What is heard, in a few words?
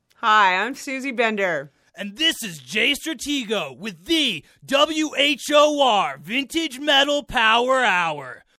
Phase Example Out